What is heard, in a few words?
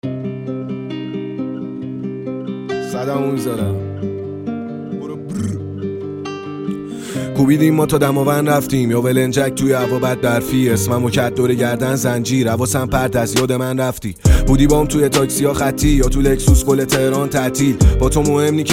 Guitar Version